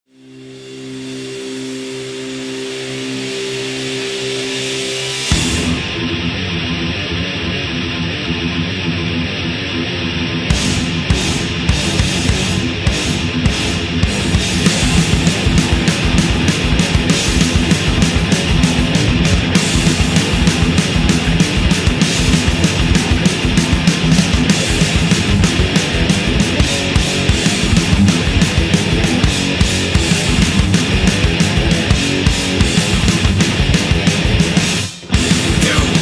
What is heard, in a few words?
thrash